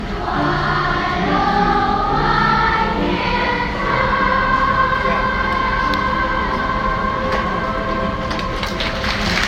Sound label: Singing